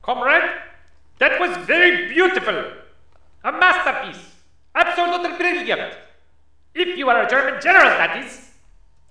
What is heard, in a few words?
DEFEAT; CD Action 28b; east front